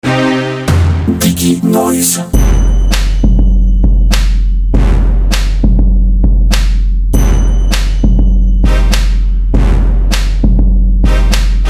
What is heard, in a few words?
orchestral one shot; diginoiz